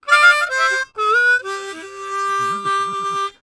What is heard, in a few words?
Harm 129bpm G, Harmonica